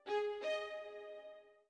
orbit violin; Sky Children of the Light gamerip

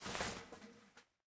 Ventil up; miside demo windows gamerip 2023

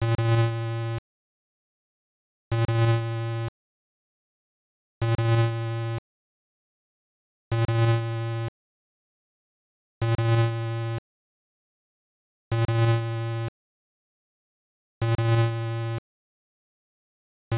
Drone; Alien Syndrome; Master System

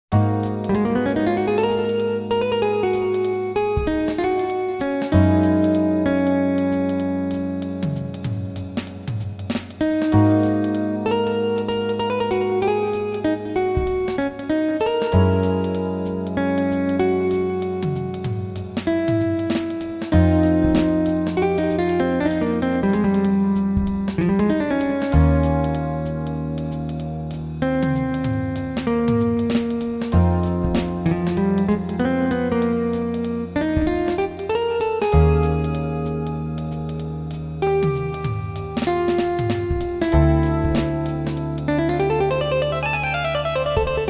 Shot Bar; eve burst error original soundtrack 1998

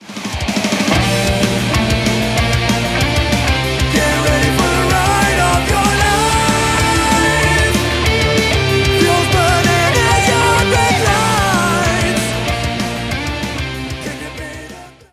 Stinger; Champions Field

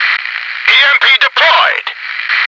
noice; Shattered Horizon

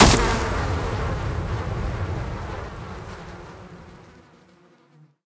Archer Angry Bees; Warmonger

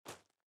SFX run gravel; out of reach treasure royale 2020 gamerip pc

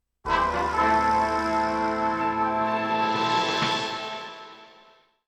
Mission Complete; Red Dead Redemption

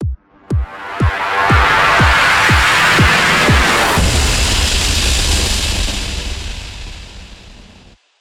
Transition; Everspace 2

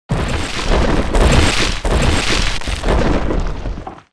Rocky Breakout; Ruins

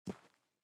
SFX step rock

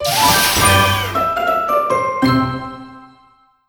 Crunchy Finish

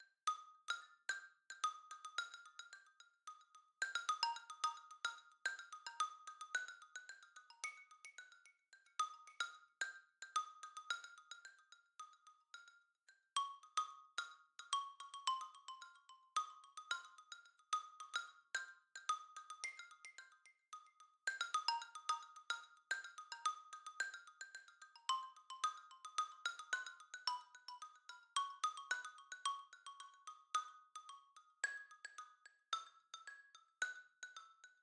marimba; Toki Tori 2+; Toki Tori Collection

